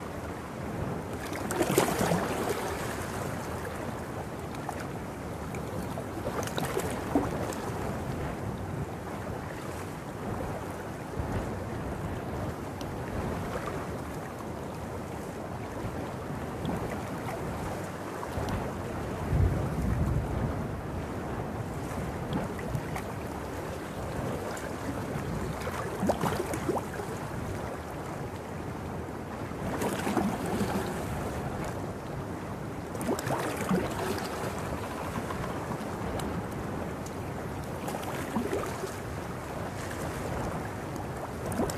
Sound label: ambient port default; World Of Warships